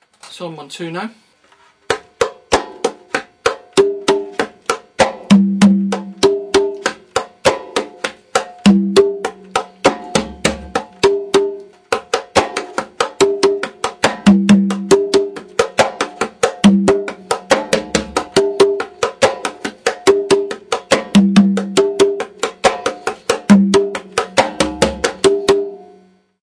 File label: son montuno, conga